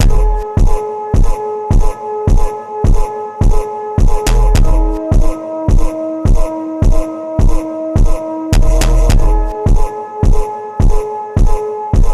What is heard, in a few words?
Funk Slowed Remix